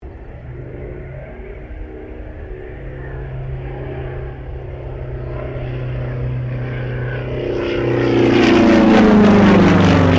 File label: Sukhoi Su-26M acrobatic pass; SU-26m Acrobatic aircraft; Airplane; Vehicles